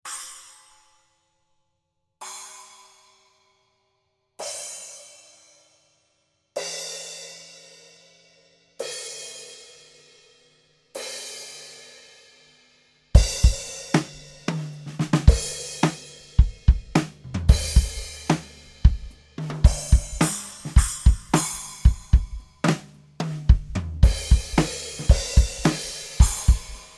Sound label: Paiste Thin